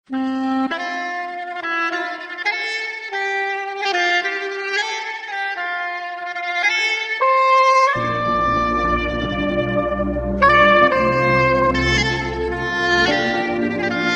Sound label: Sax